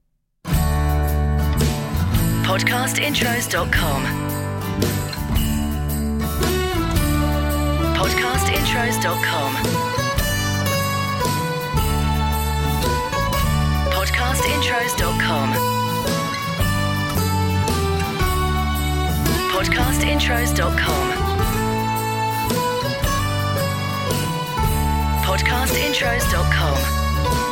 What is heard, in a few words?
CHRISTMAS First Noel; Podcast Watermark